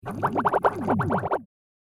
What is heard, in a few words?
Artificial Popping; Miscellaneous Cartoon; Andrew Fleming